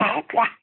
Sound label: baby boy ahdlah; nova sound